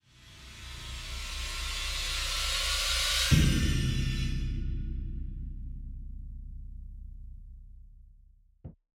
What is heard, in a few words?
Action Transition, Classic Horror Sci-Fi Music; Dead Ark; Action 3